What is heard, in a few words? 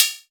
HAT Flang